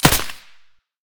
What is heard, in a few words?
sa58 silencer stereo